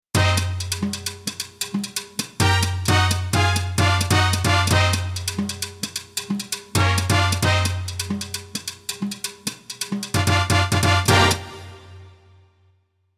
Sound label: 132 Brs Beat; xp-80; roland; 132:Brs Beat patch demo; roland demo cd-3